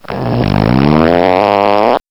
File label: fart sound